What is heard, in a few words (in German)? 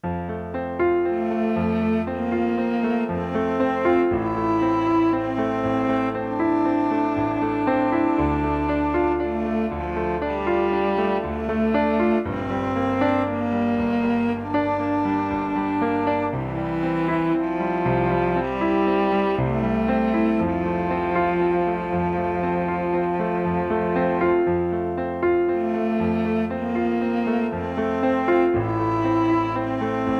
supersweet duett